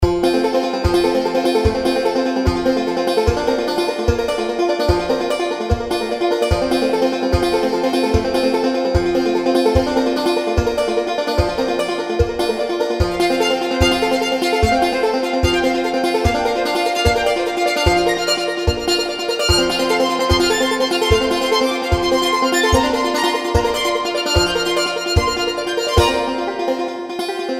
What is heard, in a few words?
bucking bronco, music